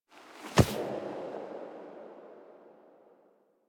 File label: Steps Close, Foley; Apostasy; Operator